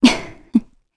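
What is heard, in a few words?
Laugh, Korean; voices; heroes; Valance; kings raid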